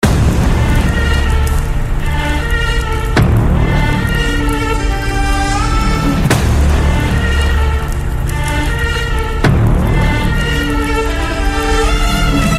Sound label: new intro music; heatcliff